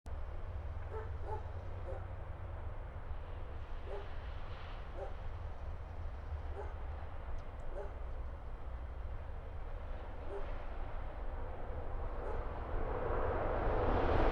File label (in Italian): Treno; Eigenmike; mono 16bits; Nuova Strada; ComunePR